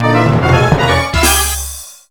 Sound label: BGM Wall Fall; Irodzuki Tingle no Koi no Balloon Trip; NDS